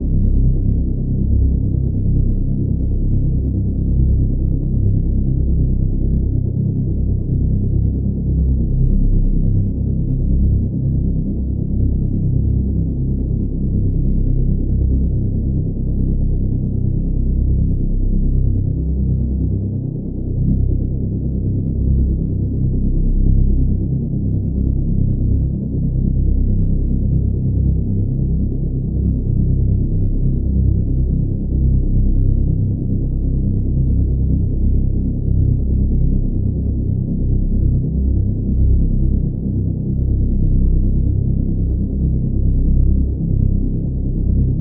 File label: Reese Bass; 808